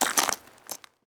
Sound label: ice step; Golden Crayon Sounds